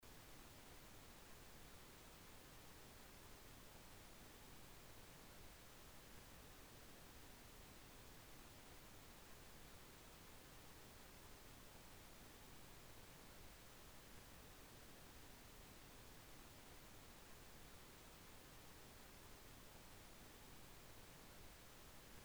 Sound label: pink noise